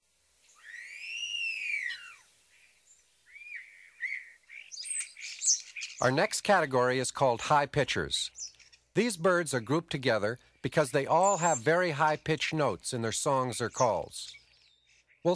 High-Pitchers- Cedar Waxwing, Brown-headed Cowbird, Eastern Kingbird, European Starling; Birding By Ear